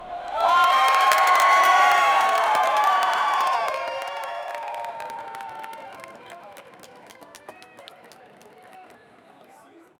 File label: large flames and pyrotech; fire